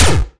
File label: gun; Spine Examples; Spineboy